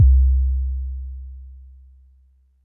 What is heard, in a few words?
sub